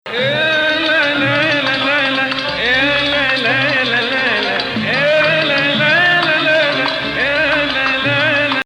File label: Sikah Jadaka Rast